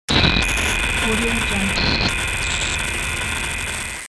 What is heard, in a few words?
glitch